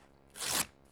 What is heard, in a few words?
PAPER